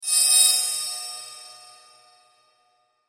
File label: Sweeper Metallic Reverb; Blastwave; Production Element Imaging Element Accent Transition